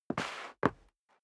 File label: step sneaker concrete scuff; schritte